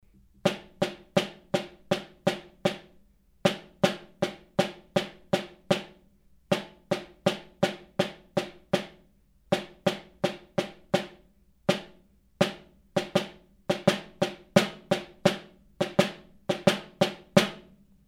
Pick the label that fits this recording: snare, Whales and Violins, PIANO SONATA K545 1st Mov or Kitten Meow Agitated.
snare